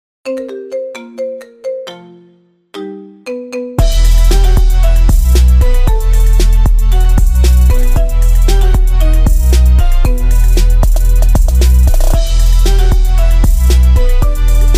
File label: Marimba Remix; Harry Potter